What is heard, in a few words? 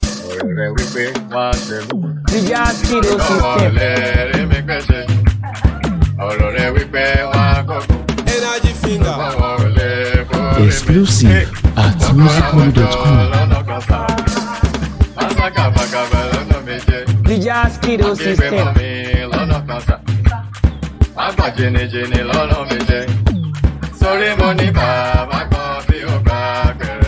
DANCE BEAT